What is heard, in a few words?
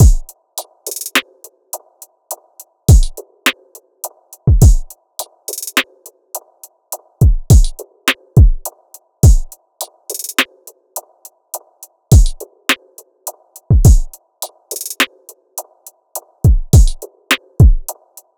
Drum Loop 104 BPM, RnB; Affection; Cymatics; ANALOG EVOLUTION Beta